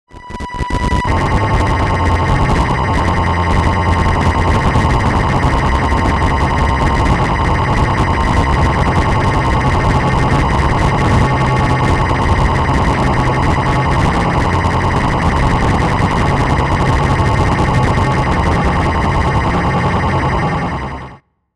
Bed Fuzz Glitch Hum Rumble; Production Element Imaging Element Accent Transition; 5.1 STEREO MIX; Blastwave

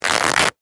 Fabric Rip Clothes, Tear; Foley; Ripping Sound Effects; Objects; Blast Wave

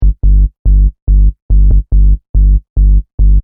Techno-Trance Sub Bass Loop In G 142 BPM; Bass Loops

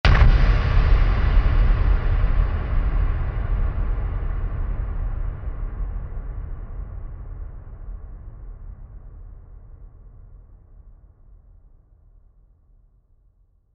Hit Impact Reverb Heavy; Blastwave

